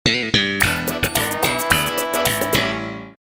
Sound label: sitcom transition bass riff; seinfeld